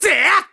Sword Spin; Link's sword-spin shouts; zelda sounds; Skyward Sword

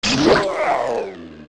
Die; Freezzard; zelda; OOT

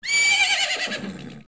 young neigh; Young Epona neighing; OOT

